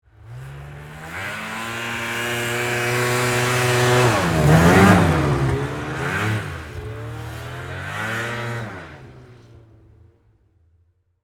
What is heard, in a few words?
Snowmobile Pass, Snowmobile, Pass, By, Distant, Fast, Speed, Yamaha, Exciter, 440; Sports; BlastWave